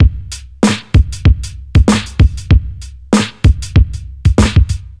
96 bpm drum loop c key, Free drum groove - kick tuned to the C note. Loudest frequency: 648Hz